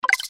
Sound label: success